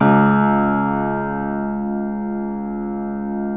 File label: keyboards acoustic C#1; USB Soundscan vol.17 - Keyboards Acoustic; AKAI